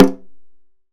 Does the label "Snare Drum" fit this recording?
Yes